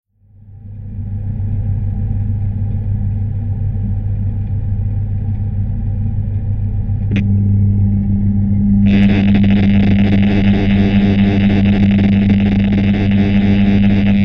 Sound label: underneath a swimming pool; stanmore